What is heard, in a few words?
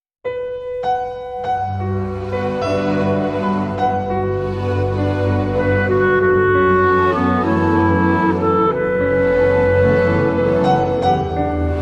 Melody With Piano & Clarinet